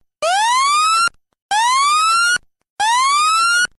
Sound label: engine